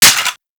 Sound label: Sicarus Mech; Warframe; Weapons